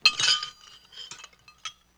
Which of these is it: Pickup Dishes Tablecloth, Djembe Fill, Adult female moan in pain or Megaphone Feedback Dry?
Pickup Dishes Tablecloth